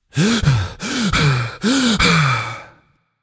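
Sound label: non verbal breath, voice; cernunnos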